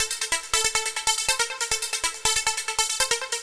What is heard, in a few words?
Synth, Synths Lead; Dance